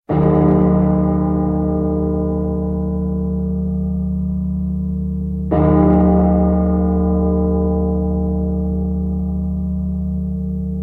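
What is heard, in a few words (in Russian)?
cerkovnij zvon, church bells; Церковный Звон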